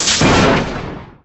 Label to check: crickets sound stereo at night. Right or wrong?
Wrong